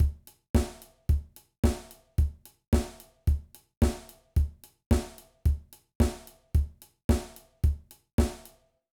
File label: Disco Drum Beat